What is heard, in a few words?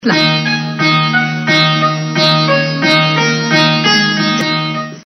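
background music, Ringtones; Shejari Shejari Pakke Shejari; ZeeMarathi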